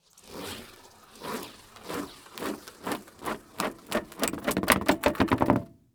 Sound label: Impact Tire Roll On Cement